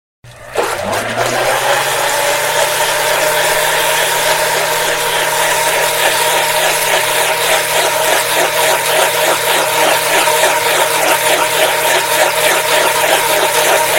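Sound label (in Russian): звук блендера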